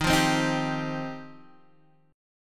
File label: F 7th Suspended 2nd